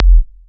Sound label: thorough kick, electro FX